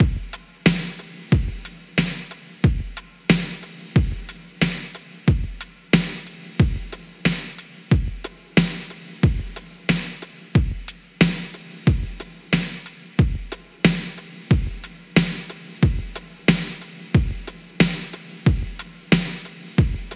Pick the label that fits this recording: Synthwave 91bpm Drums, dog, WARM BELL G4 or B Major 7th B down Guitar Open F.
Synthwave 91bpm Drums